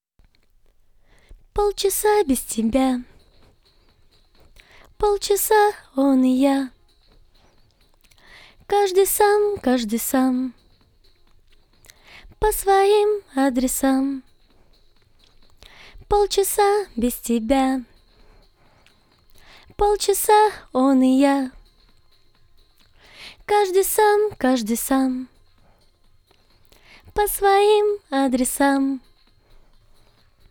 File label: Lead Chorus, acapellas